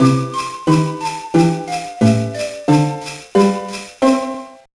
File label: All Candies Collected; The Polar Express; GBA